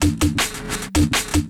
DRUMLOOP D+B 160, Drum+Bass Loop; eJay

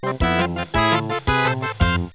Reward; Treasure Galaxy (1994); Learning Company

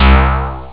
hammer, synth bass

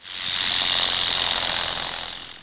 snake

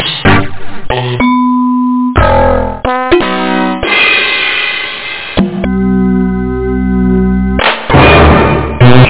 Hat

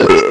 Burp; Nuclear testing

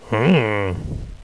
huh

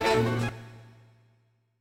STAB, AcidJazz Funk Fx; LiveAct Pool 1

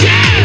gabber

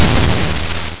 Crush; AeroStyle

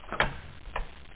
shuffle

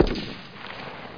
Gunshot

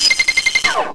SCANNER; PC World Komputer 1998 May; rrdemo

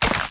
Seed Layer Damaged; PC Gamer IT CD 32; SWARM

